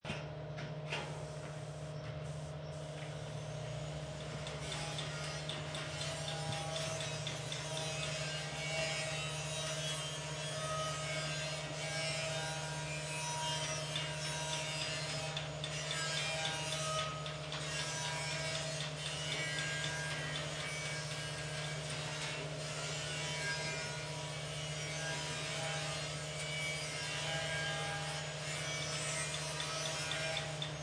Car Workshop Ambience, Garage interior with air compressor noise, hit; paint gun and sander. Seamless loop; Auto Shop